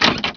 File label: kchunk, doors; Heretic II Demo